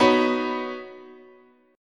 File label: B Major Add 9th, chord, guitar